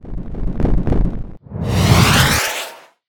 escort rocket

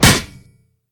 bullet impact

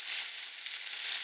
grass loop

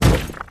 stone break

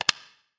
menu button

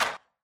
damage tick

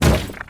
stone break

